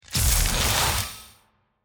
Conductor Crystal Turn On; TANK; 3Souls

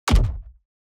environment gold block destruction kick

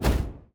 necromancer projectile; Shelter

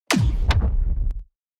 building destruction low

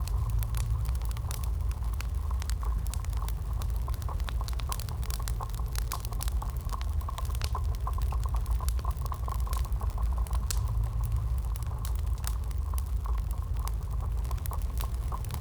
Burning Crystal; TANK; 3Souls

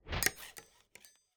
block upgrade building

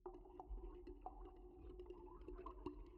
stir

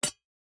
environment pickaxe hit iron

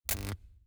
zone electricity zap

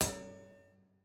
ambience room polygon hammer training

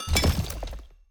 successful coridor build

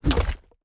ui construction plan place

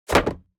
object grab wood

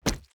object drop bait

